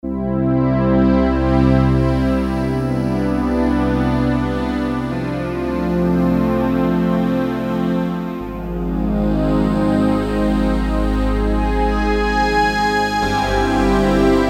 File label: PAD